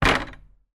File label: household gate wooden close